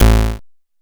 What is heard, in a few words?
PO Bass A, chip; CHIPSHOP HITS